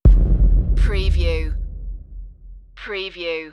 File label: dark afterlife stinger